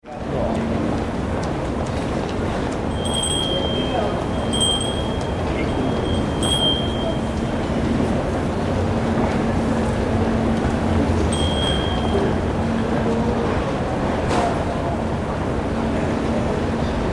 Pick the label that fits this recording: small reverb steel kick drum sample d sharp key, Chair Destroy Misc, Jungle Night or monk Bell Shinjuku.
monk Bell Shinjuku